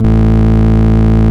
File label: VOX CONTINTL; Big Fish Audio - First Call Vintage Keyboards